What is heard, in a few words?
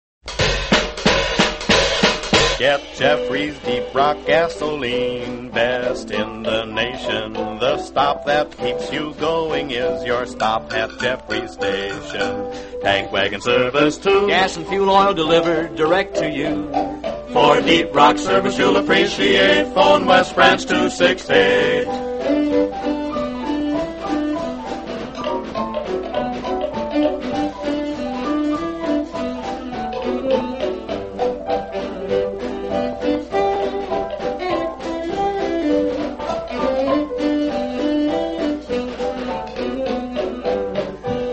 radio jingles; jeffreys gas station; allied radio artists